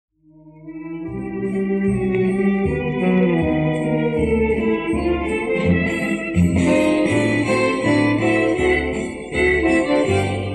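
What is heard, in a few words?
instrumentalnyiy ansambl, instrumental ensemble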